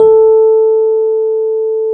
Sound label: E PNO, KEYS; Synclavier Sampler Library